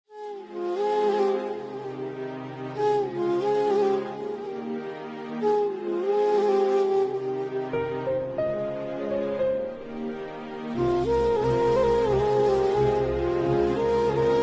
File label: Movie Bgm Violin Ringtone; 3 Movie